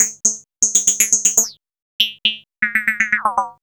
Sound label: POLYMOD; AMG - Vince Clarke Lucky Bastard